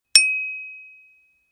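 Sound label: din lojkoi po chashke, ding with a spoon on a cup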